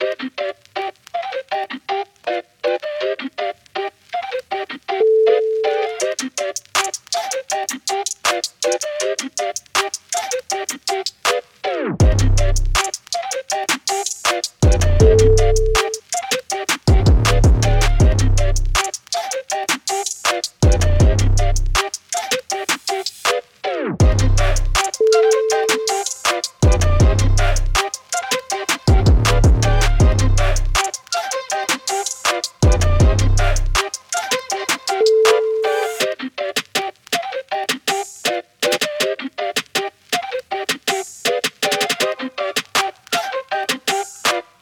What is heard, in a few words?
tiktok beat